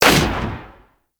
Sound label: Shot, Weapons; Warframe; Tenno; Protea; Velox Prime; SMG